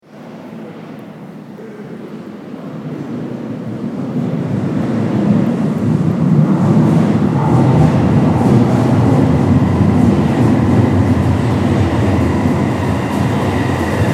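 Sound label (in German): Bahn S-Bahn fährt ein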